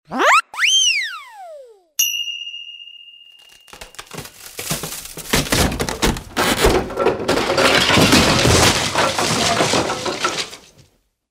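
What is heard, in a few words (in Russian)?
звук короткого взлета свист падения дзынь касания чего-то и обрушение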